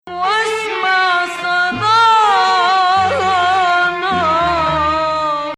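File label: Ajam Hijaz, Arabic music; Ajam, which resembles the Western Major scale, has two distinct versions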